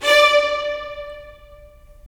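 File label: strings violins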